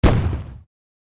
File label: pung